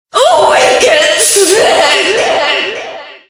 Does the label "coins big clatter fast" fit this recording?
No